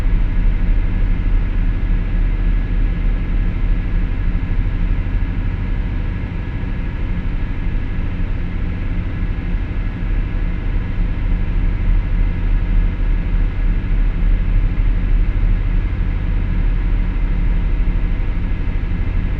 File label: background